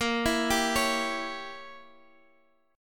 A sharp Diminished 7th Guitar Half Step Down, chord